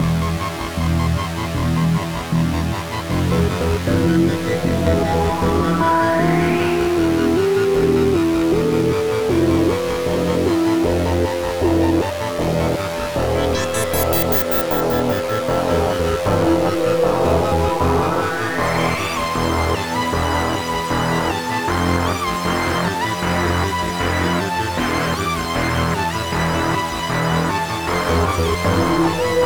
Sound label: synths; the warriors 2005 ps2; destroyers fight3